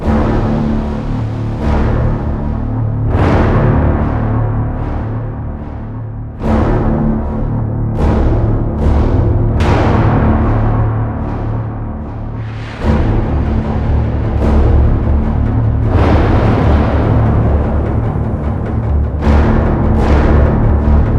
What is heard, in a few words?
Match End Loop